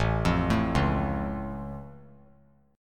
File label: A Augmented A down; arpeggiated; JGuitar